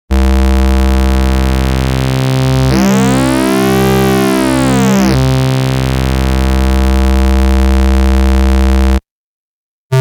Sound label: hohner hs-2 e sounds saw5 to saw5 phase distortion